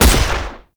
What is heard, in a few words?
Pistol Fire; weapons; Warframe; Grineer; Orokin